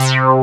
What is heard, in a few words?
Hybrid Bass C3; Digital Sound Factory Xtreme Lead